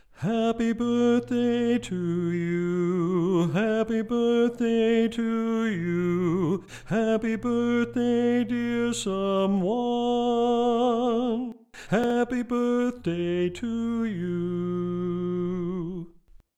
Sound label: Happy Birthday Bari